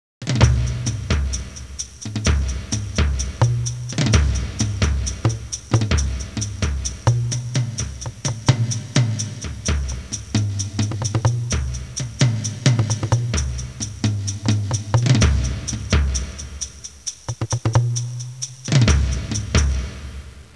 suonerie musica ritmica

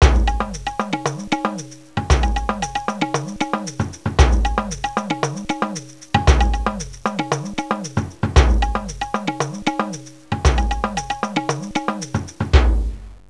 suonerie musica ritmica

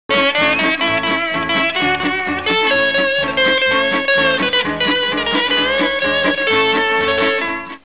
musica strumentale

